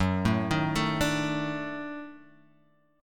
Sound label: D sharp Minor Major 7th Flat 5th F sharp